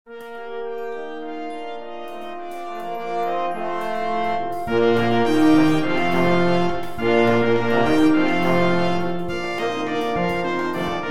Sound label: brass band